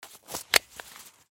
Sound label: helmet